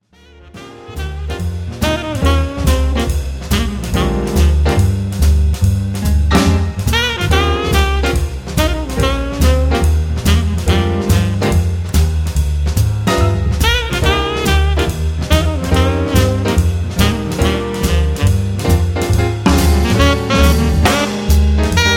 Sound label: Blues